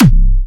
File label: Springer C4, Big Room Kicks